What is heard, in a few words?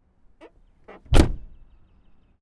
car door close external squeak; spoteffects